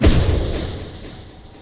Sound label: hit release; hollow knight